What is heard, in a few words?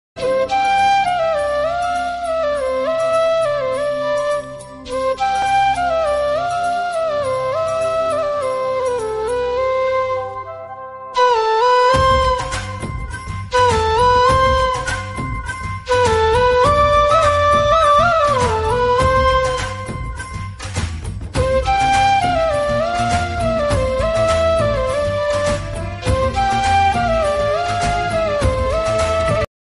flute ringtone